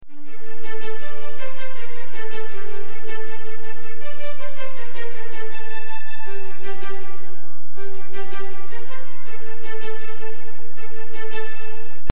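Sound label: Loop viola staccato Dm 160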